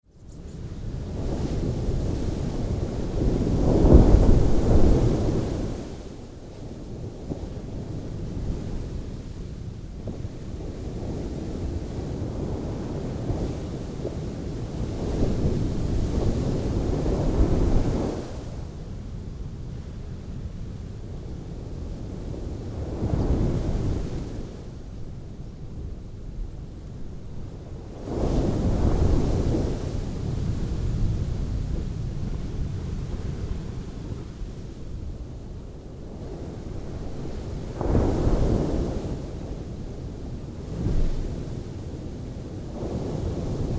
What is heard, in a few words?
Ocean Sea Waves Dull Recorded from behind Sand Bank; Ocean Sound Effects